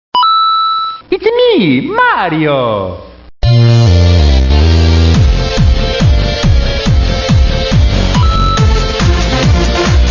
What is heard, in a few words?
dance beat